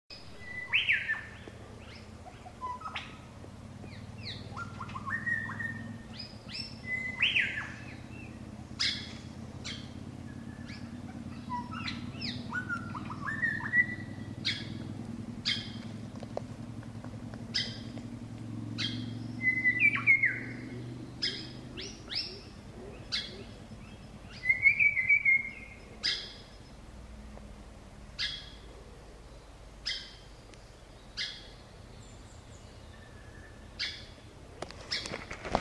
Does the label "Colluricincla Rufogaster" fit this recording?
Yes